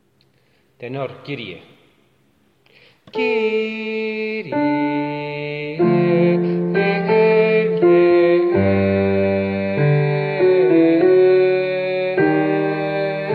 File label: kyrie ten; Kyrie (misa 'O magnum mysterium); Tomás Luis de Victoria